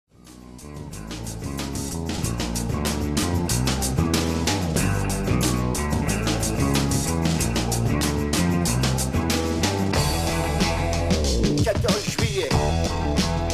Bass; Bass Micro

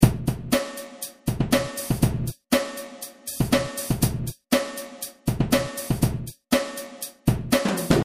XPando Kit

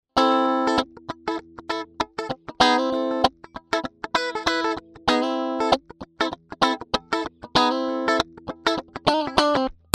AC30 clean funk

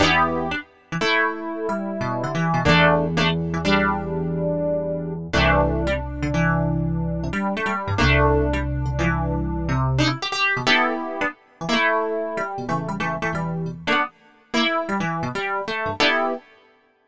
Chime ePiano

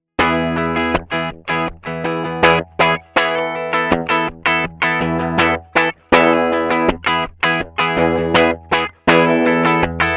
Brit hi-gain

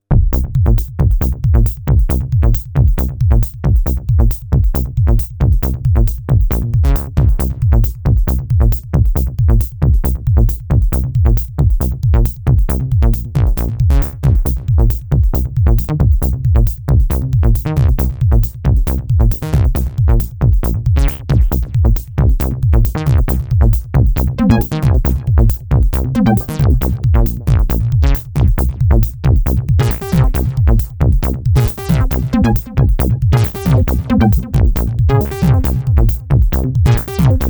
TECHNO

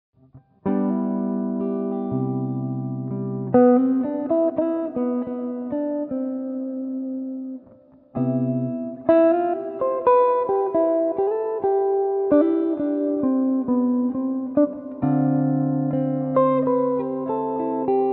Mellow jazz

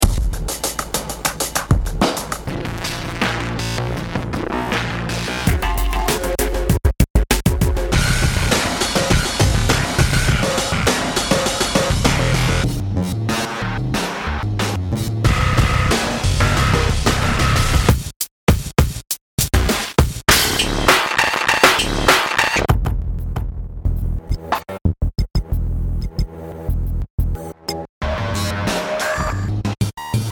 Electric Beats Loop; Hybrid Aggression

